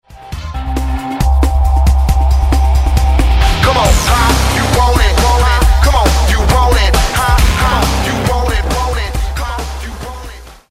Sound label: riff